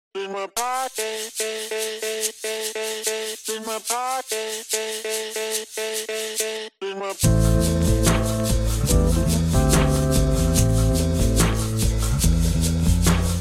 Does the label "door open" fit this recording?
No